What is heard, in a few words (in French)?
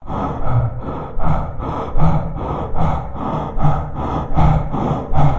Coprs Respiration Rapide; Puddle